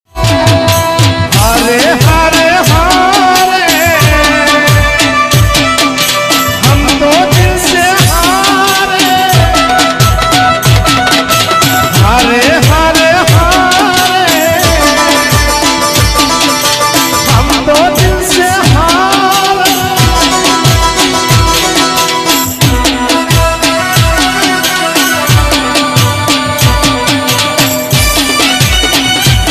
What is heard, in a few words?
Hare Hare Hare Original Gujarati singer Song Ringtone